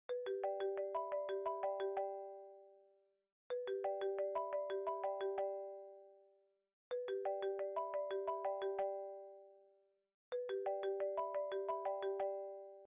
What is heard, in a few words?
ringtone